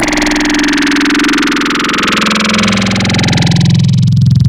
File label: Growl Table, Serum; Cymatics